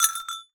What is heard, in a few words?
Cowbell Chain, Percussion